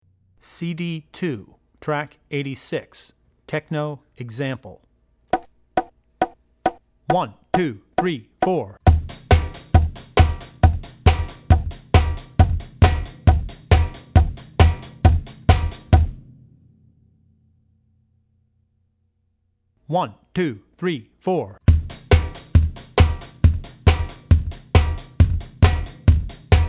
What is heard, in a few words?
Techno; Drummer's Bible Tracks